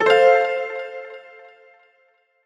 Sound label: piano success notification; audioblocks